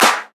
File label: Hand Clap Sample A Key, Royality free clap single hit tuned to the A note. Loudest frequency: 2587Hz; single hits one shots